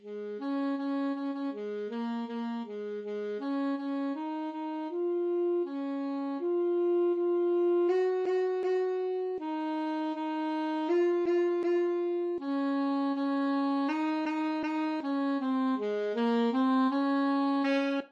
Bingo, saxophone

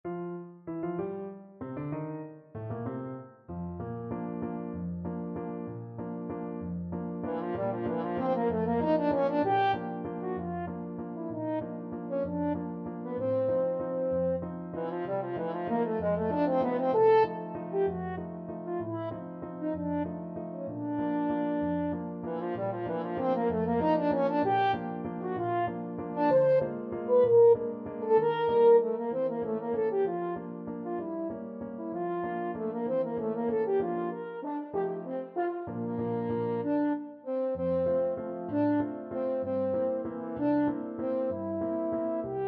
Voices of Spring, french horn

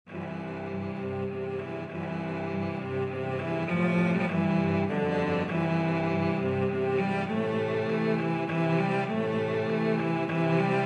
cello quartet

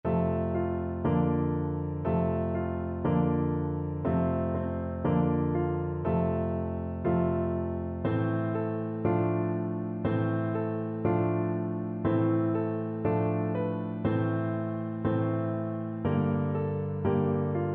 ohne worte, piano

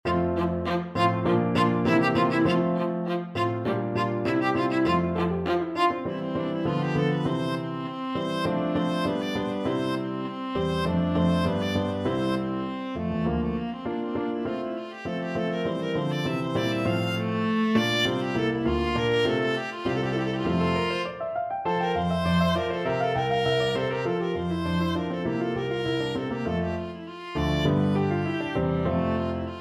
galliard sonata, viola

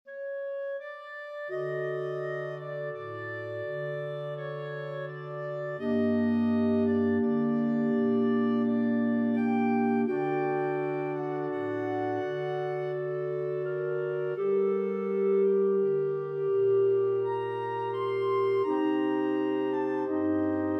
6 clarinet, clarinet choir

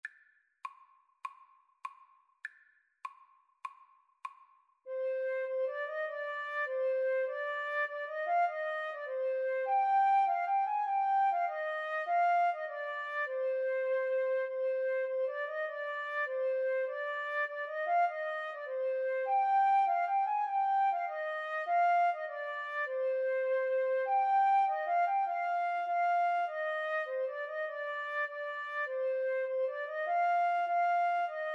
deep love, Flute Cello Duet

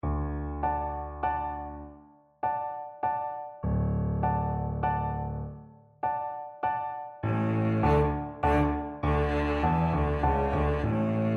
elephant, cello

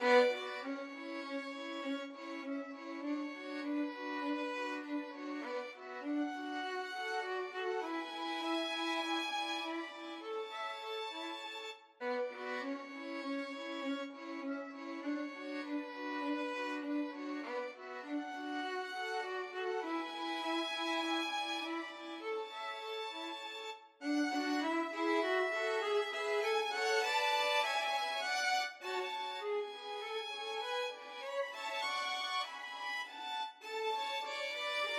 idylle violin trio; chabrier

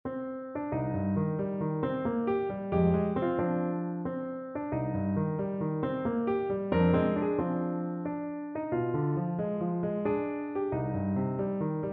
PNO

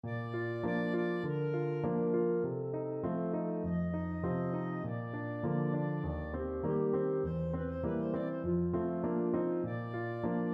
schubert andante, clarinet

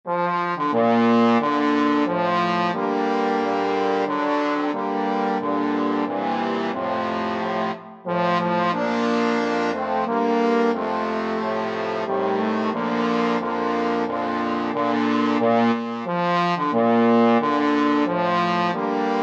trombone quartet

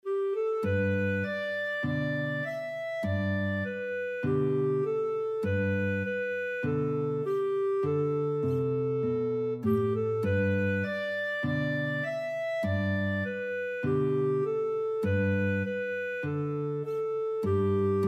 guitar clarinet duet

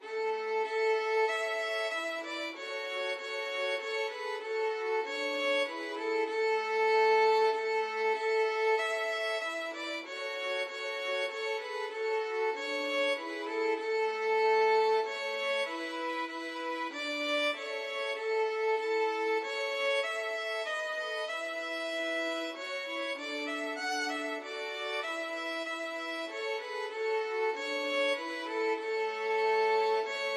Christmas Hymn violin trio

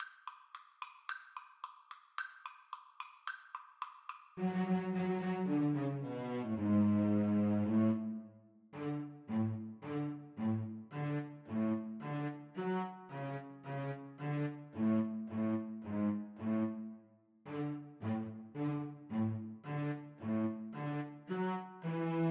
cello duet; radetsky march

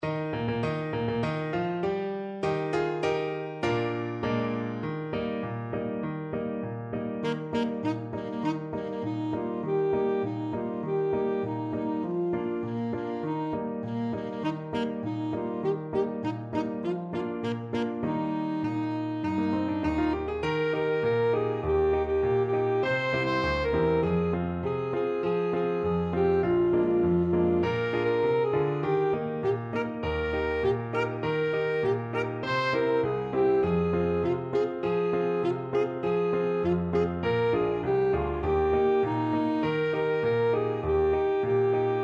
ASAX, saxophone

